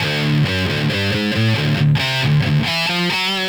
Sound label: Electric Guitar, Loops; Guitars